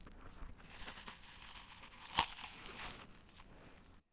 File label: Flesh Tearing Off Bone